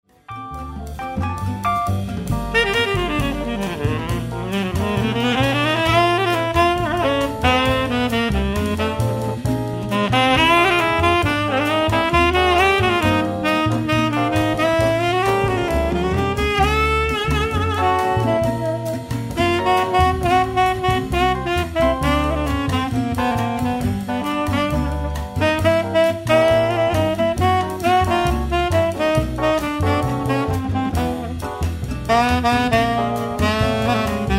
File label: sax